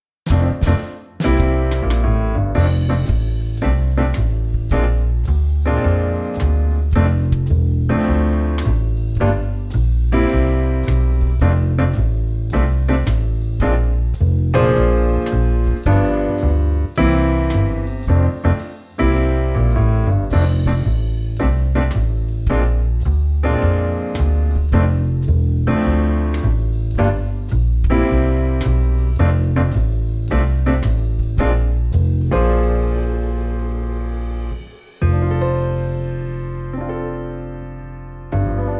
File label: Band Track